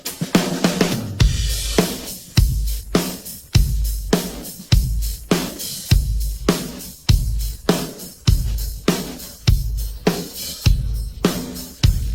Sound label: drums pattern